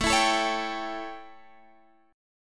A 7th Guitar Drop D, chord

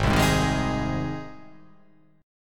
G sharp Major G sharp, chord

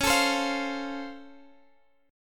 C sharp Augmented Major 7th, Guitar, Standard; strummed; capo 3; x,x,11,14,13,13

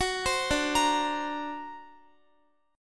D Augmented 7th, D, down, Guitar; arpeggiated; 4E,4A,5D,5G,5C,6F; chord

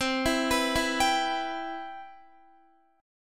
C Major 7th, Guitar

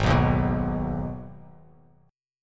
G sharp Minor, chord; 4,2,1,4,x,x,x,x

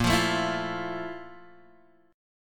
F Major 7th Bb, chord; Fmaj7/Bb strummed; JGuitar

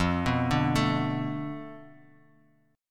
F 6th Flat 5th F down, chord

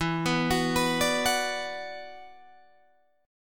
E 7th Suspended 2nd Guitar DADGAD; arpeggiated

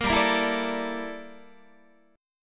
G Minor 6th Add 9th G down Guitar; Gm6add9; strummed; 13,15,13,14,13,13

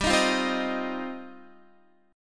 G 7th Suspended 4th Sharp 5th, Guitar

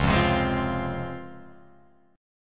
Db Major 7th Db down Guitar Open F; DbM7 strummed; JGuitar

